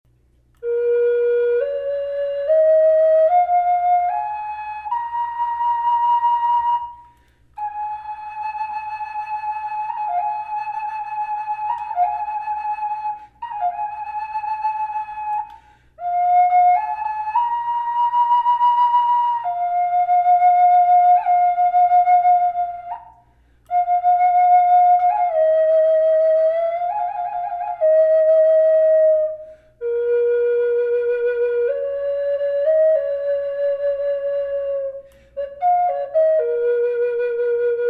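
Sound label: reverb, flute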